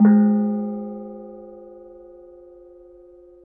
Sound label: KENONG; Spectrasonics; Heart of Asia; KENONG PH